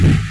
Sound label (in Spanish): GEN LIMITADOR; AOS F1 2022